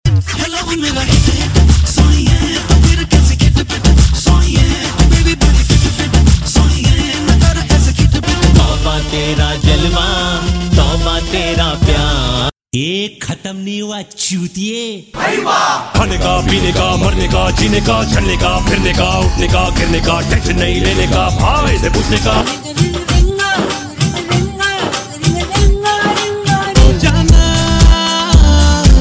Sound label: Bollywood Remix